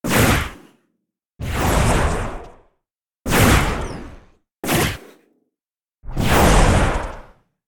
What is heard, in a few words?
Sudden Movement Swooshes